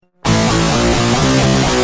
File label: Guitar Rage, Industrial